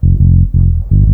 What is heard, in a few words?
BASS LOOP, Ragga & Dub Reggae; USB Soundscan vol.27